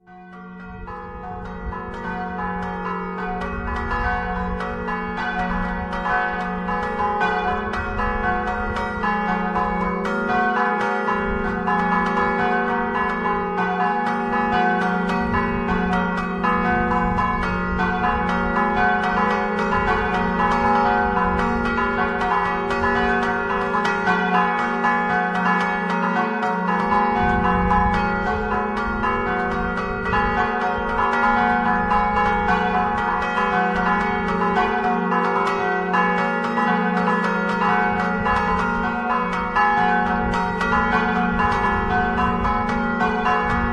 Cividale San Giovanni, campane

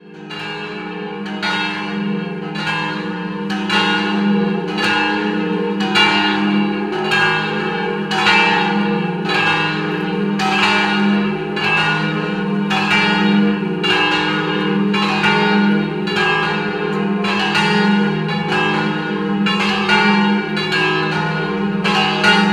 Barbeano, campane

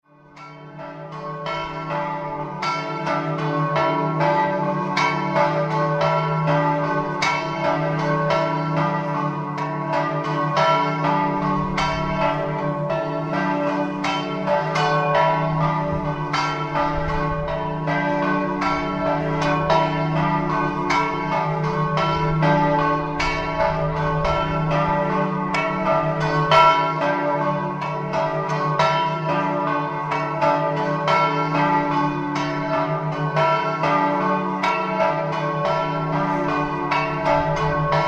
PERSEREANO, campane

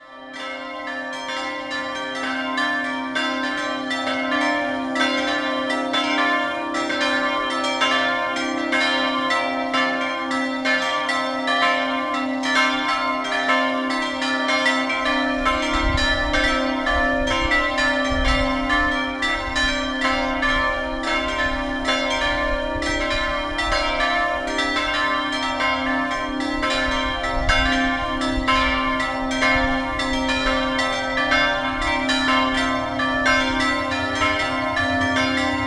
Villotta, doppio a 4, campane

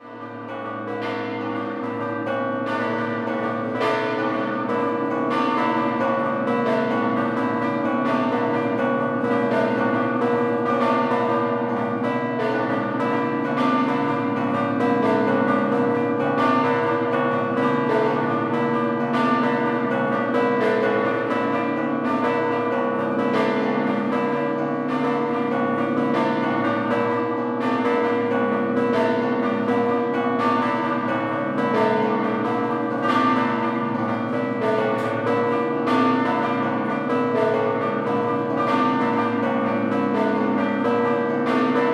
Mortegliano Scampanottata, campane

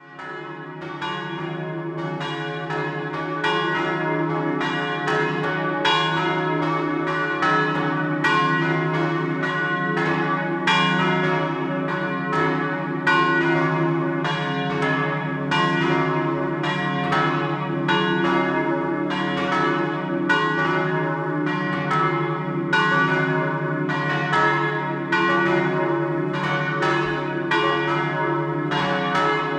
Travesio, campane

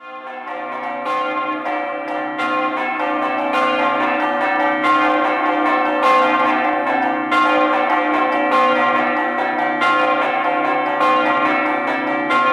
campane; OSPEDALETTO

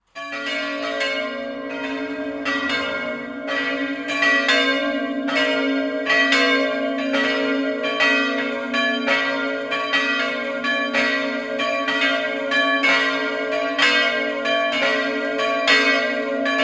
Rosa, campane

